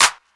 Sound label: clap latin